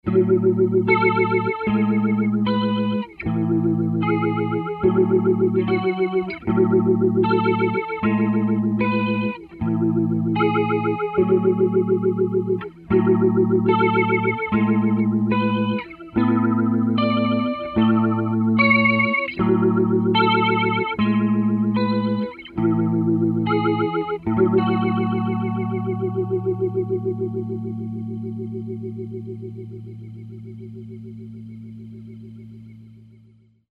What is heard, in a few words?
Resonance